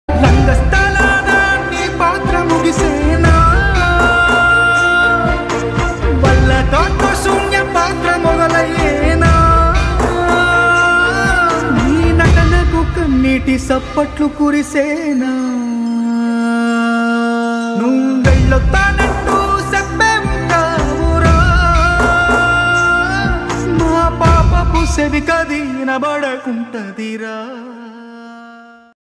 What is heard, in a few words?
telugu ringtone